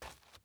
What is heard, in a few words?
dirt step; teardown; ps5 windows xbox series xs